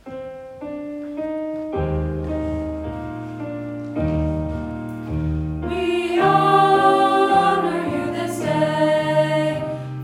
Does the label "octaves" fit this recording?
No